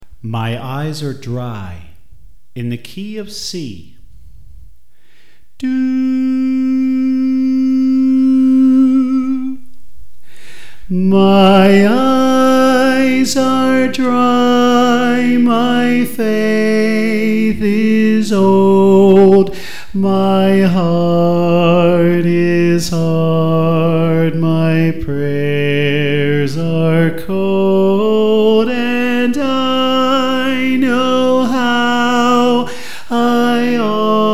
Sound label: Soprano